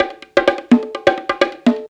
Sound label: CONGA LOOP, Perc Loops, Techno Trance